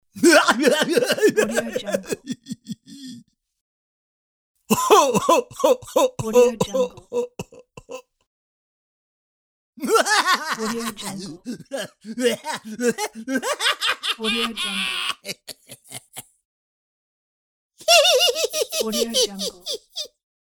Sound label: whacky laughs; cartoon